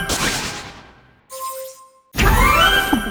Chest (Bronze); Mario + Rabbids Kingdom Battle

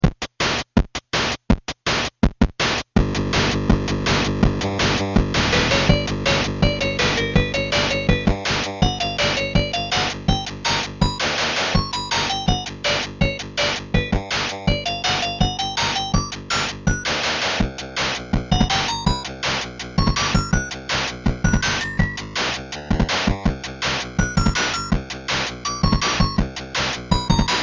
Egypt Harp; bill teds excellent adventure

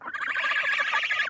Sfx - Gosling Panic

